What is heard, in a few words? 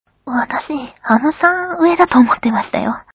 hum